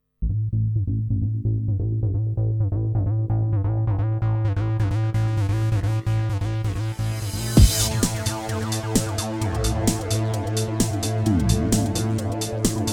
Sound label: Style Progressive